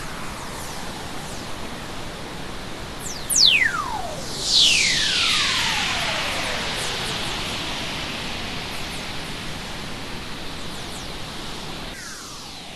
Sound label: WFR audio emfisis